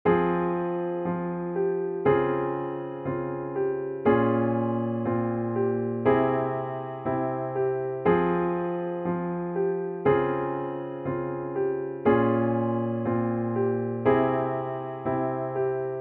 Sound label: C aug enharmonic